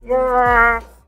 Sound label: Rawr